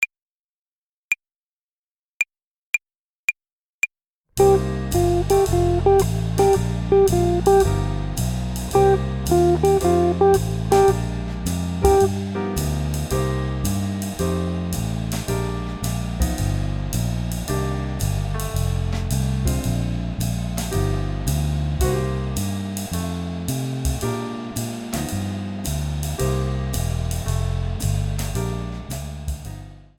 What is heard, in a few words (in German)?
Swing-Phrasierung Übung 3, Play-along, Gitarre; Jazz Club